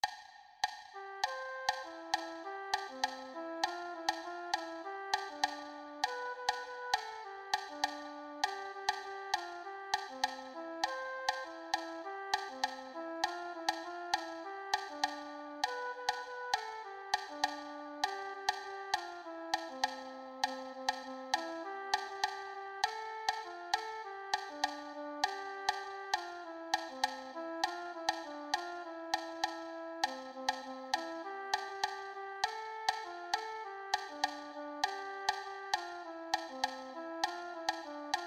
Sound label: PERC; La leyenda del Pájaro Flauta